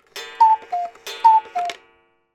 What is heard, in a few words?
household clock cuckoo strike